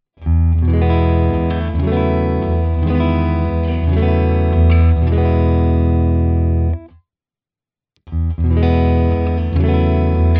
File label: AZS clean all positions